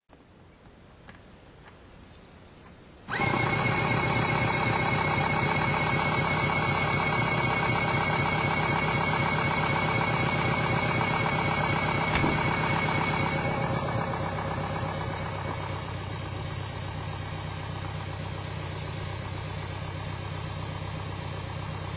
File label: airbrush, basic; タミヤの「ベーシックコンプレッサー」を作動させたときの動作音です。; タミヤ ベーシックコンプレッサーの作動音